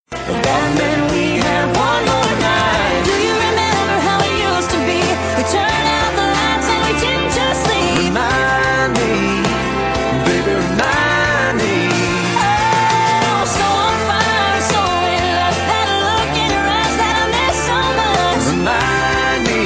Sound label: Remind me ringtone